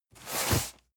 cardboard box medium slide hard; objekte

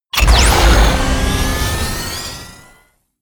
sci-fi collect coins heavy; hits impacts

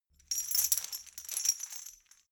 metal chain handle clean

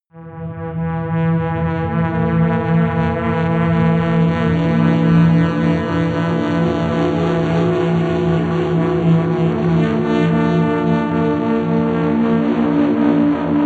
drone crazy elephant

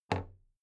big plastic case handle bounce soft

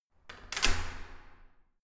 entrance door close normal

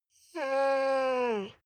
dog whine low complain; natur-tiere; hunde

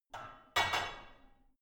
barbell only replace unsteady; sport freizeit; fitnessstudio

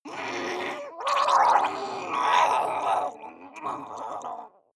water monster female gargle angry; koerpergeraeusche; comedy-cartoon; soundpool

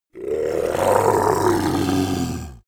monster dark growl evil; kreaturen

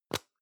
plastic can lid screw open hard; objekte; dose

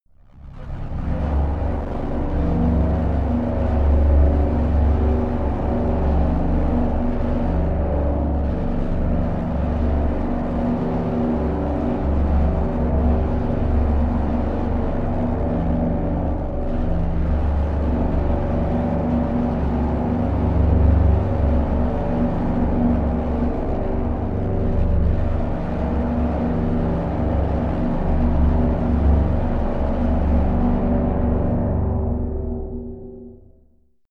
drone sci-fi generator

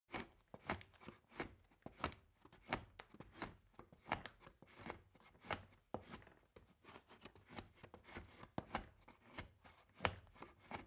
step ladder wood scrape; schritte

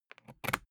briefcase plastic latch lock key insert quick; industrie wirtschaft; bueroarbeit

